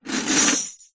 keys large bunch prison guard take out of metal security box